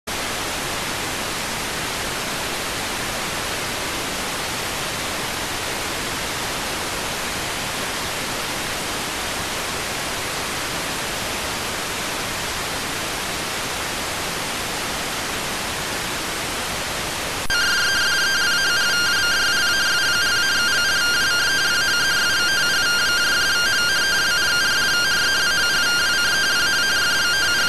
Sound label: rtty rx